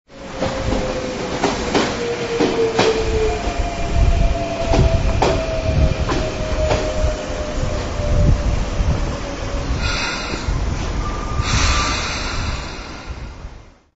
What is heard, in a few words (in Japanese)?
densya